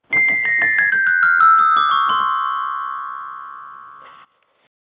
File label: Cute Musicbox Tone